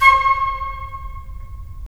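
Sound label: FL, flute; LA Composer vol. 3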